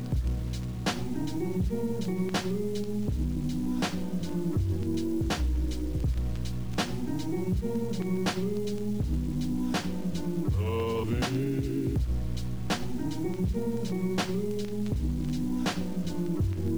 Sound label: chill; sven; theater